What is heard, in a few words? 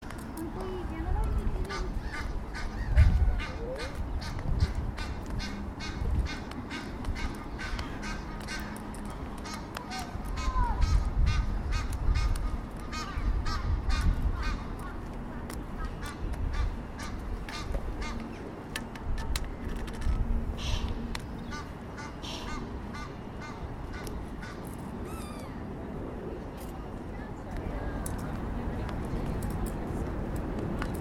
excitable thames birds